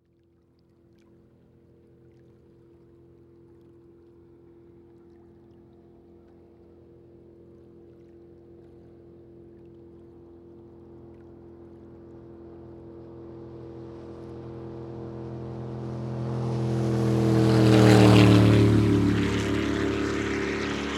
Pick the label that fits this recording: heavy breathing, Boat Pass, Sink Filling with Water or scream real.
Boat Pass